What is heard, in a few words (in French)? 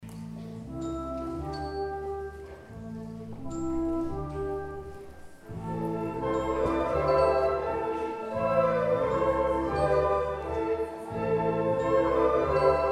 Postlude enregistrement tascam Concert; Roman de Renart; Correspondances